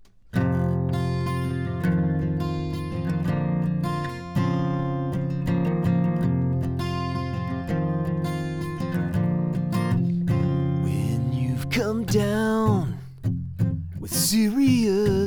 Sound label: mic Gtr AND Voc